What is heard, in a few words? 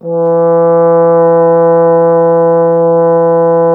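brass bass trombone E; Miroslav Vitous; Solo Instruments VOL-1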